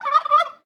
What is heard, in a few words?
Gobble Gobble, notifications; Google Pixel 4; Seasonal Celebrations